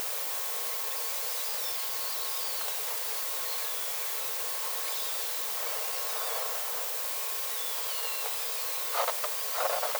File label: Arnoux beaked whales